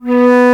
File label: Flute mf; Multisamples; Digital Sound Factory Virtuoso